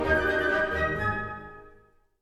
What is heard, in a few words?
Comical End 64bpm; Music Dialogue